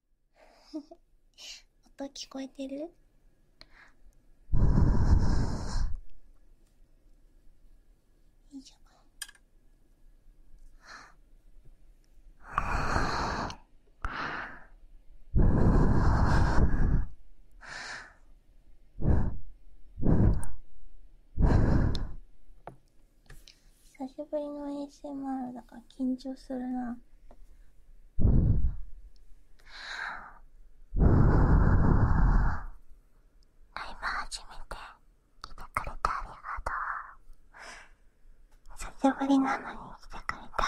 甘やかし耳舐め; とっても眠れる♥

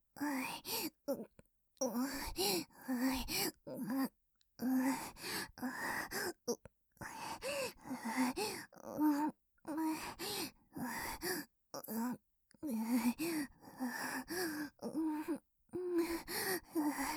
喘ぎ声のみ

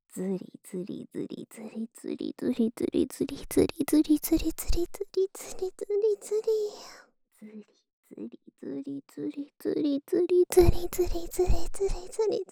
ずりずり

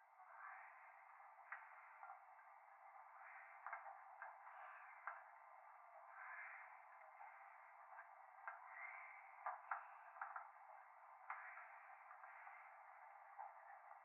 風呂 右耳密着吐息 電車

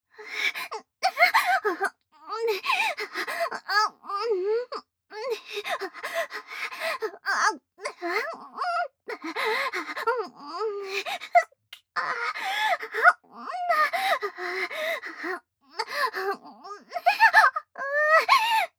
喘ぎ声（騎乗位・激しめ）

